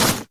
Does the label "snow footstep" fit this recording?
Yes